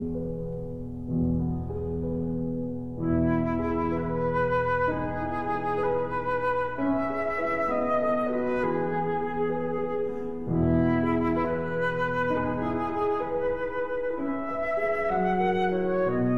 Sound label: Berceuse (Lullaby) for flute and piano